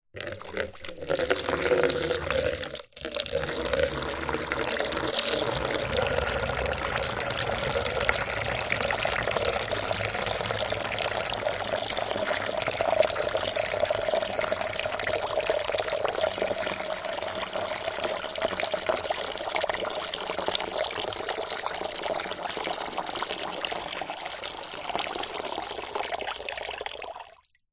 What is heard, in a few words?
foley liquid water pour into empty plastic container long